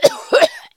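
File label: cold cough in a man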